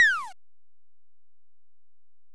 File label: bomb; invaders